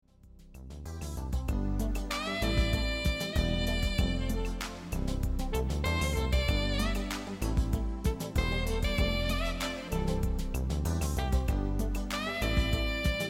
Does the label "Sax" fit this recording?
Yes